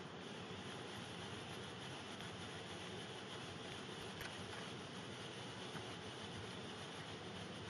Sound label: backwinder seatrout, Reel